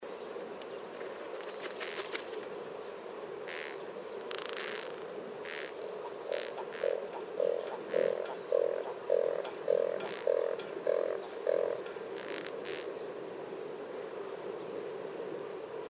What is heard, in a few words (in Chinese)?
紅鳩; 火斑鳩; 鳩鴿科/留鳥